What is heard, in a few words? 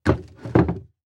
Household Chair Grab And Move